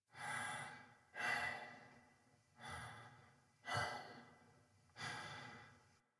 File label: Breaths; Arthur; Warframe; NineteenNinetyNine; Stage12PartyOfALifetime